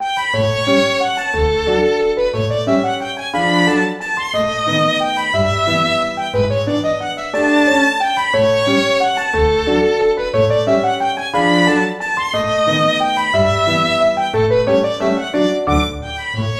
Light Comedian, synth

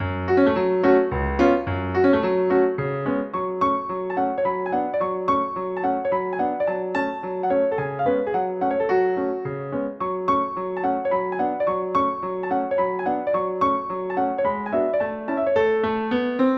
Bolero, synth

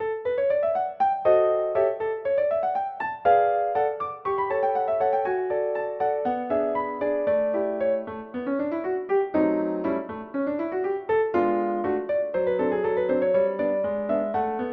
Scherzette for piano synth

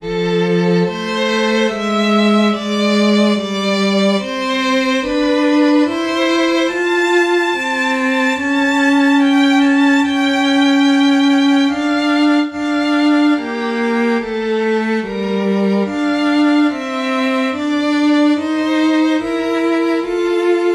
Plaintive Religious; Violin, cello, piano; synth

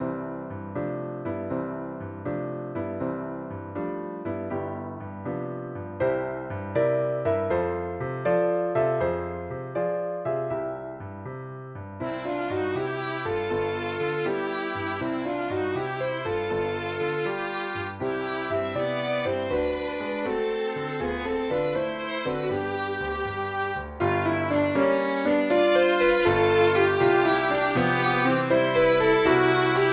Playtime's golden hours for violins; viola, piano; synth

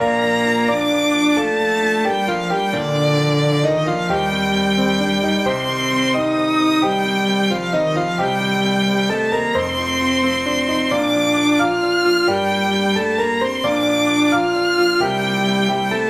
Hero; Violin, cello, piano; synth

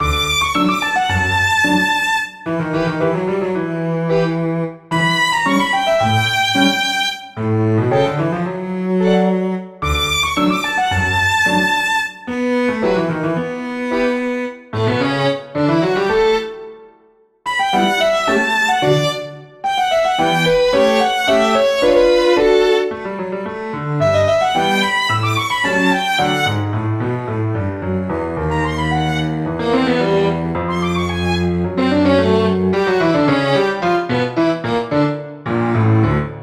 Music for conversations; Violin, cello, piano; synth

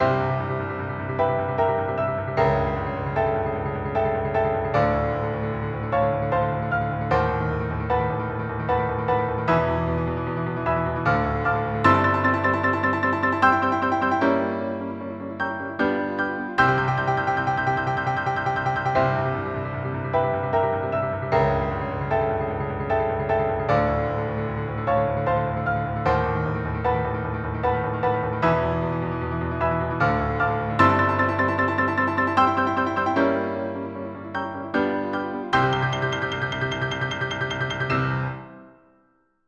Heroic; synth

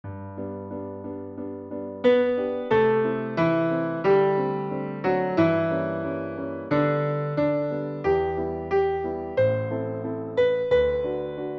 Lotus gentle flower for piano synth

